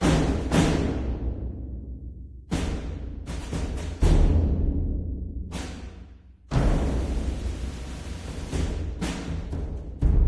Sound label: Drummer; Burthorpe; RuneScape